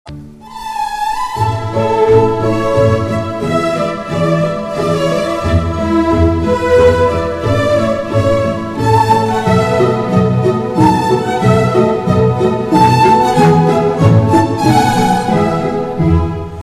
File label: mini minuet; bocheriny